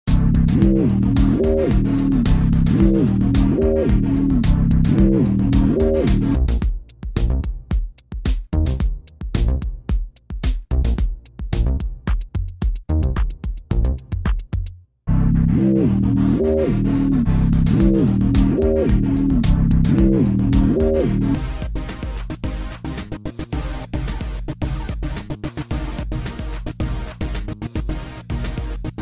Beat

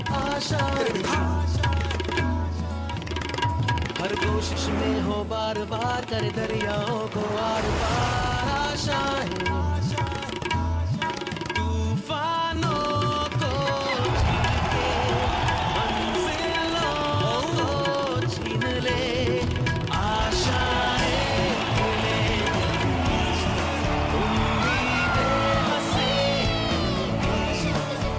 Tabla; Maruthi Tvc

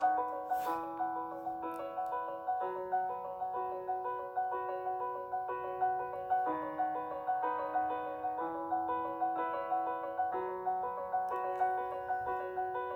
Sparkle

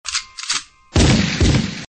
Gun Shots

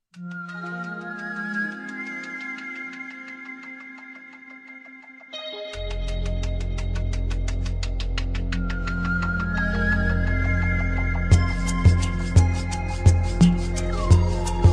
Mild Tone

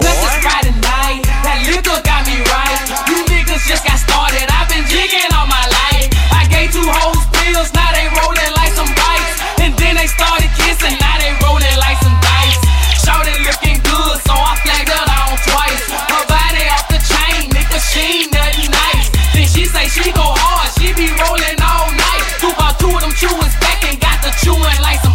Level Wobbly

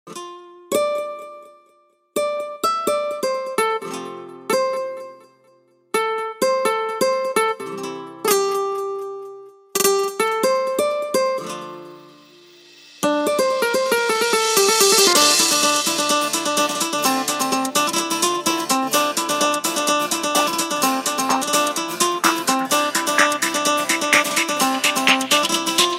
Guitar Mix

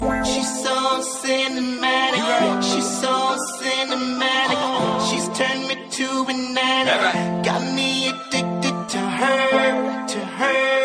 Cinematic

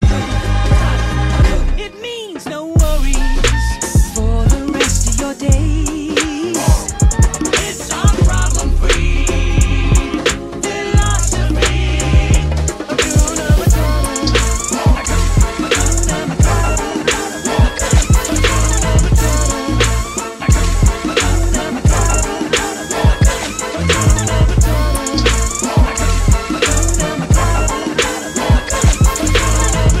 Trap

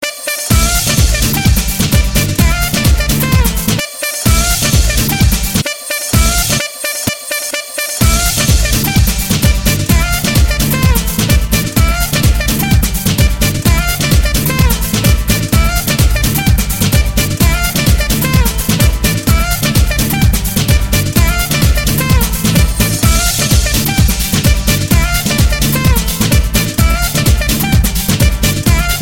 Saxo Latino

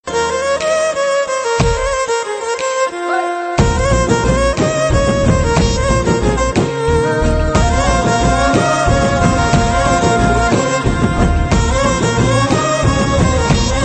Classical Tune

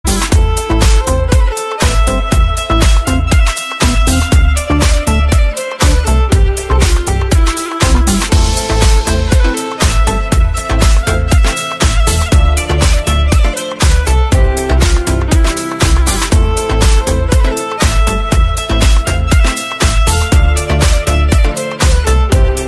amazing violin

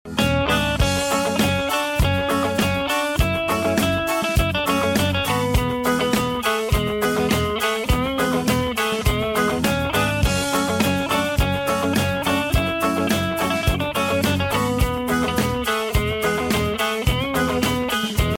Radio Solo

